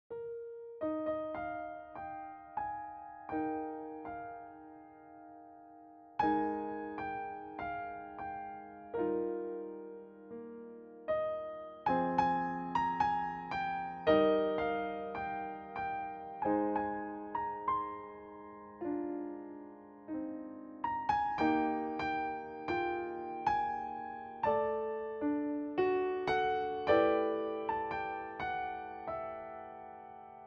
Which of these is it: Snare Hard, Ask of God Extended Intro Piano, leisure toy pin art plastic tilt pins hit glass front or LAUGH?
Ask of God Extended Intro Piano